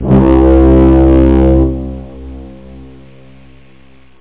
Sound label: orch brass